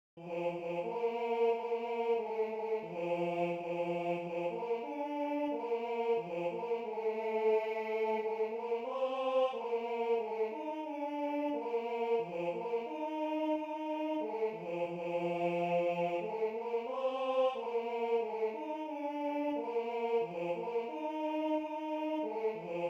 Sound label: Tenor II; Kemmts lei einer in die Stubn